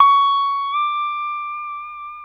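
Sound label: pedal steel bend up C#6, Left; PEDSTL BENDS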